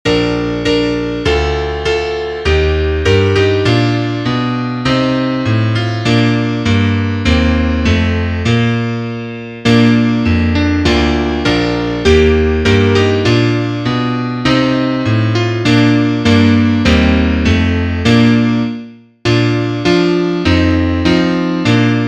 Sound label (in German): Klavier Solo, trompete; Alle Vögel sind schon da (Duett) Seite 60